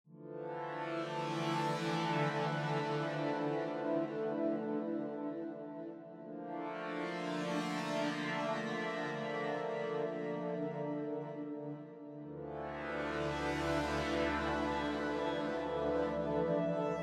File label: Bright Warble